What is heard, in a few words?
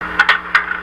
Badumpbump